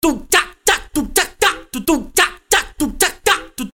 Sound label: Beat